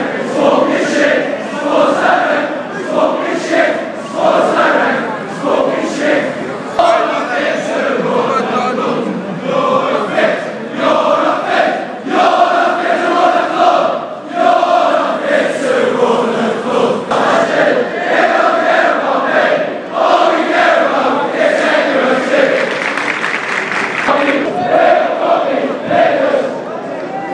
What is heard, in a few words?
st james park protests